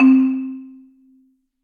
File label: Marimba Rubber Hits, Orchestral Mallets; Ableton